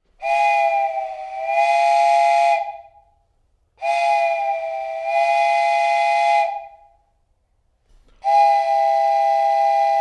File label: Wooden Train Whistle, Various whistles; Cartoon